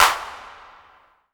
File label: Clap; Vengeance Samples; VEC1 Claps